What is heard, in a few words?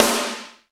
SNR SUPER, Snares; Roland - Rhythm Section; Snares 6; Sn Modules 6